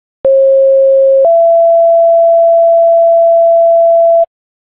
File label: siren, tones